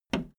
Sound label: video tape player plastic fast forward push